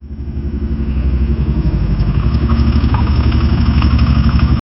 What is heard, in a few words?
engine rumble increasing, trucks